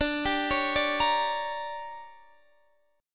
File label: D Major 7th Suspended 4th Sharp 5th, Guitar Open D